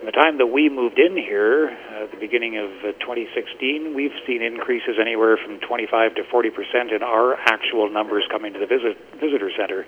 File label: Tom